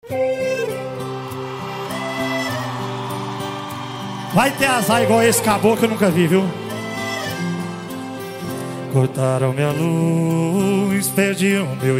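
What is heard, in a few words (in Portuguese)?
especial sertanejo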